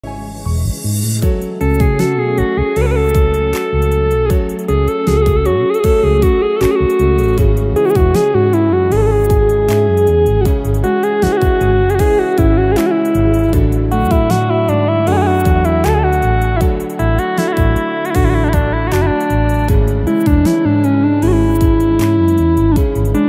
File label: Sad rain in the night